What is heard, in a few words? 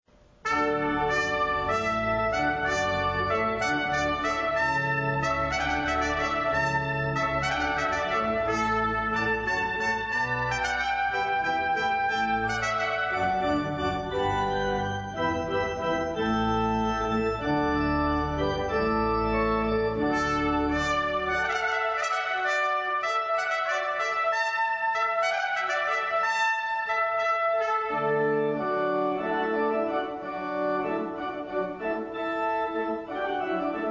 Hornpipe